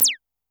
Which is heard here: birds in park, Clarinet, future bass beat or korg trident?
korg trident